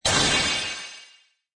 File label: Magical Blast Shimmer, Magic sound of casting spell, conjuring or wizardry; Witchcraft ritual summoning elemental or otherworldly; Blast Sound Effects; LynneMusic